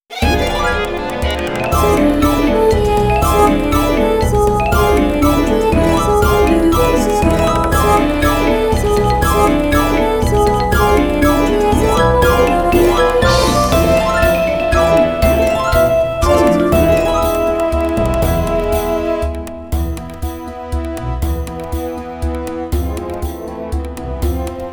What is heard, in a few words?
Harp Performance